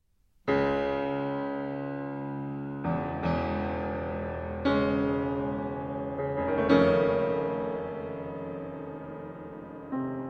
Piano Sonata in B-Flat Minor, Grave - Doppio Movimento